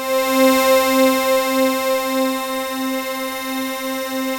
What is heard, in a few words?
NOIZ SYN; Masterbits; Vintage Voltage; SEQ-FX